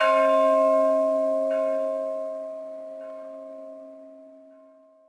small bell; ambient; World of Tanks Blitz

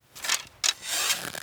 bow draw; Rust

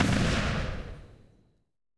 8mm tail close, guns tanks; War Thunder

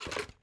ui pickup plastic junk; Rust

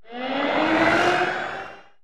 enemy airplane incoming; War Thunder

